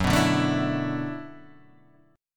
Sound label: E 7th Suspended 2nd Suspended 4th, Gb, chord